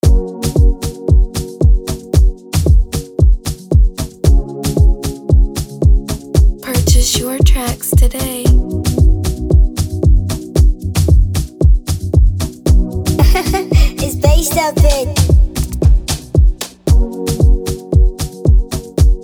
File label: 114bpm F major, amapiano